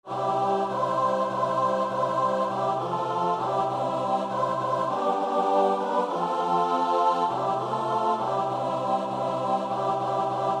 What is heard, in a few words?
CHOIR; kanzenzenze